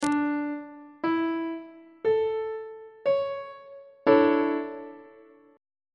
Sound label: e double flat major 7th suspended 2nd chord on treble clef